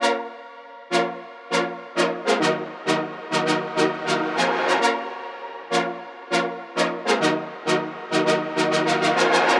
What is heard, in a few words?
Soft Chord Loop 100 BPM A# min, Future Bass, Synths